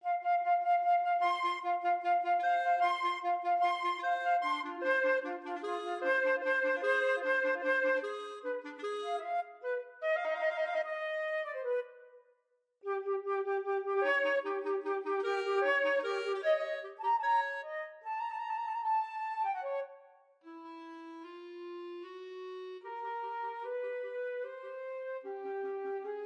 poules flute clarinet duet

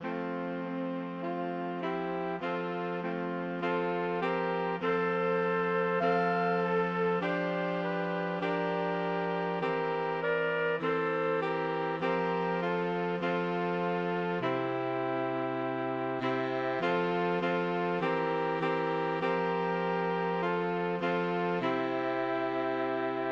ave, saxophone quartet

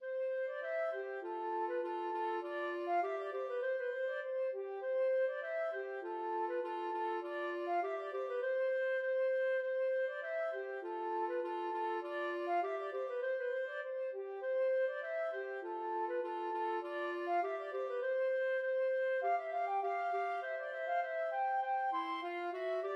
la rose flute clarinet duet

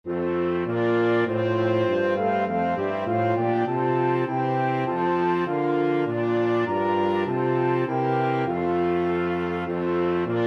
flexible mixed ensemble, 4 players

